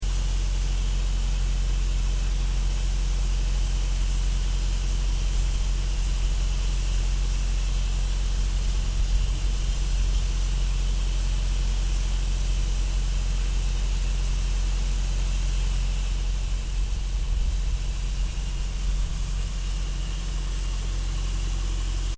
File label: Industrial Machine Unknown Hum Loop; Various industrial machines; Machinery